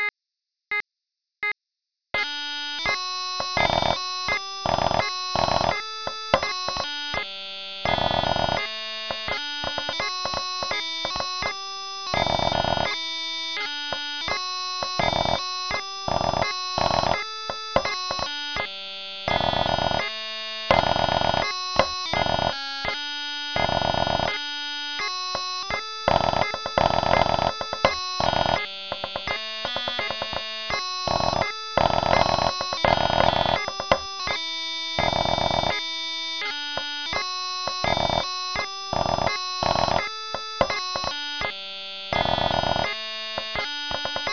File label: Pipes Snare - Basic 84bpm; Guard's Welcome